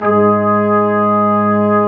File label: BRS QUIN, Brass Quintet; Roland LCDP06 Brass Sections